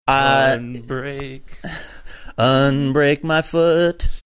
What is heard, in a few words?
singing unbreak my foot